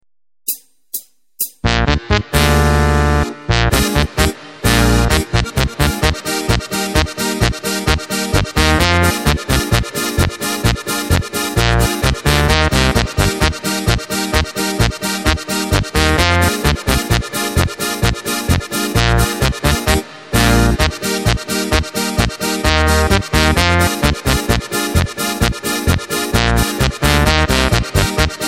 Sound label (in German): Steirische Harmonika